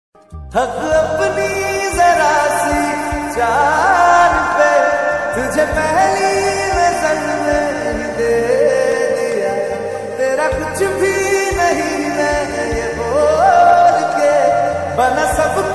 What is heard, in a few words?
ringtone lofi song